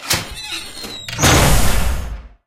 underground switch

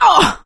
hurt minor, survivor, voice; source engine; left 4 dead 2; producer

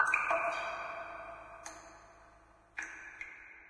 ambient water drips; video games; nature; source engine; portal2

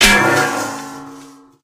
grilled lid drop; video games; player; taunt; grilled gunman; source engine; tf

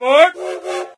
travel startup; windows 9x; sound schemes

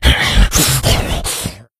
become enraged, infected; Left 4 Dead 2; Source Engine

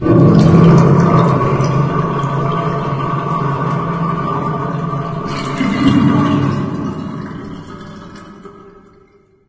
ambient water drain; video games; nature; source engine; portal2

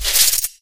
cloth foley; Portal 2; Source Engine; video games; coop

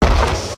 giant demoman step; source engine; tf; mvm; video games

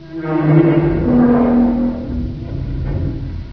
rocket groan; source engine; hl1; video games

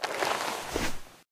taunt workout get up; video games; source engine; tf

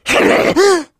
pain; Left 4 Dead 2; Source Engine; video games; jockey

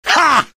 laugh short; demoman; source engine; tf

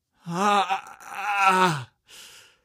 moan, male; Source Engine; video game; npc

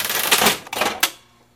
dispenser generate metal; tf

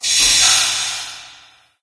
amb rand abstract hi; source engine; portal2

